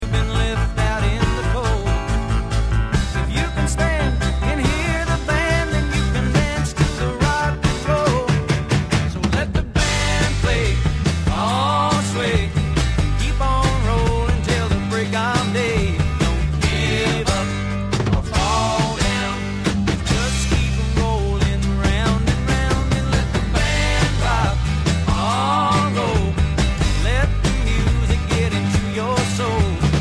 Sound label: dance